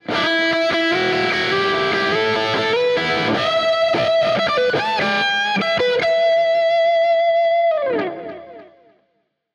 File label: harley benton erx84 modern